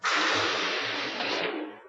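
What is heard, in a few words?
cargo door close; romulan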